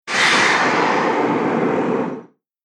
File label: Fantasy Thunder Strike; Scary Soundscape Sound Effects